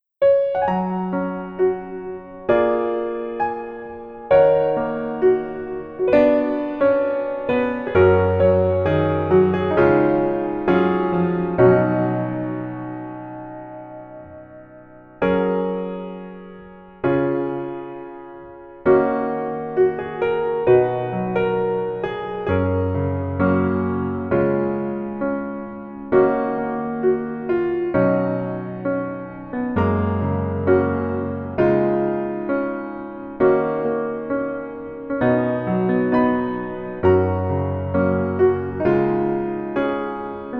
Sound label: Piano ver